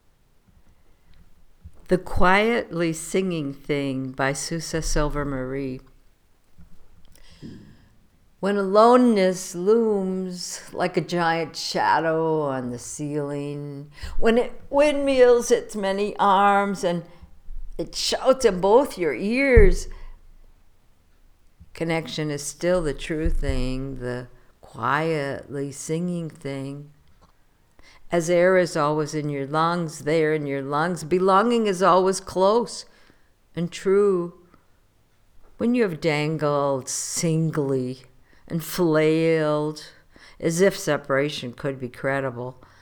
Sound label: Quietly Singing Thing